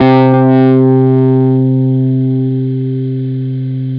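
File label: overdrive C, gtr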